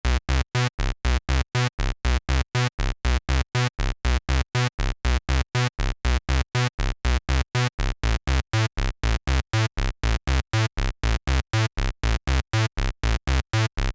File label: Pulse